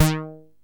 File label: TEISCO, BASSES; Masterbits - Vintage Voltage; VV BASSES